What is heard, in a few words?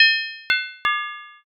metal drop